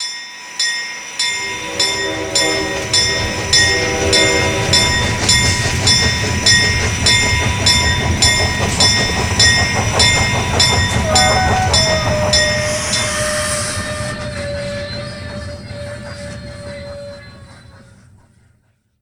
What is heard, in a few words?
Bell and Train, alarms